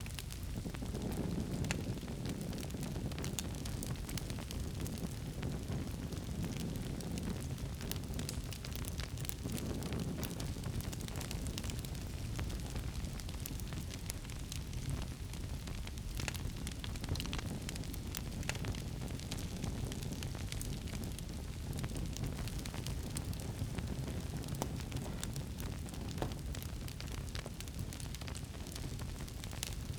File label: Fire Burning Loop; Survival Game Sounds - Lite Edition; Environment